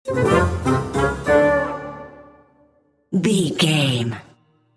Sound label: cartoon